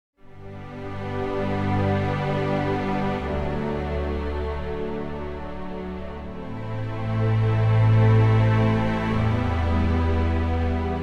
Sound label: Legato Background Chords